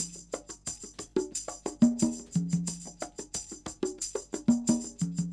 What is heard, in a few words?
con tam, Percussion; Big Fish Audio - DOM